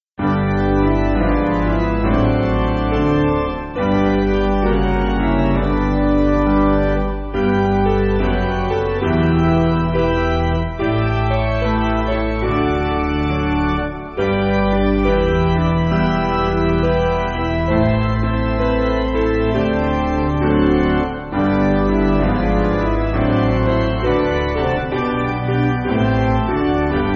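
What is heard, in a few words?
Piano, Backing Files for Churches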